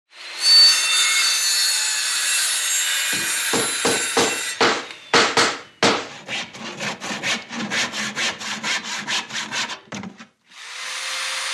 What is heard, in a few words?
Circular Saw Cut